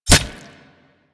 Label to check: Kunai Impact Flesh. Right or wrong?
Right